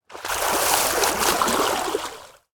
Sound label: Water Underlay Play